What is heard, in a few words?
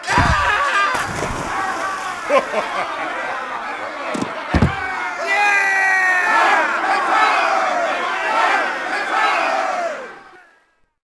duel outro amb win; Sid Meiers Pirates; XBOX